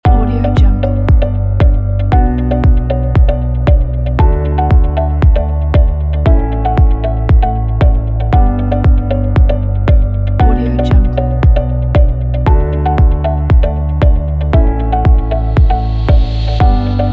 corporate inspiring

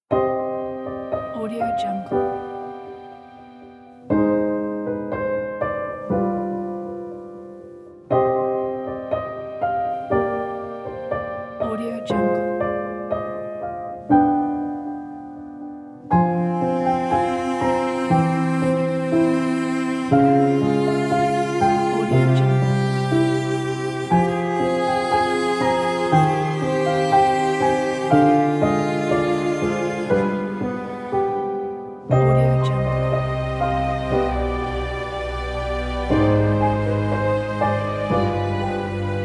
sad violin

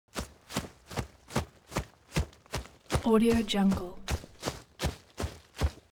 foley step leaves wet run heavy sequence

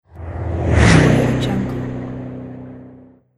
fast car pass; fainstudio

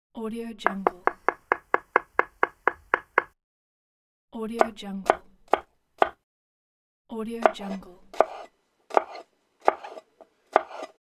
chopping vegetables on a wooden board

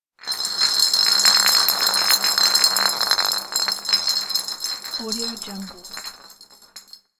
glass bottle rolling

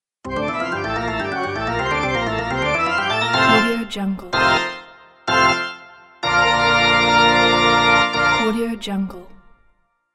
sports and circus organ

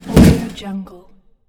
impact; soundsground

